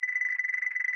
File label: Smooth Scroll; Audiosurf 2